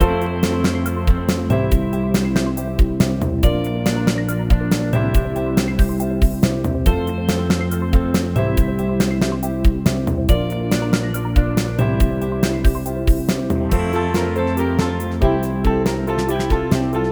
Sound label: Beach loop; cupid kiss cute puzzle windows gamerip 2022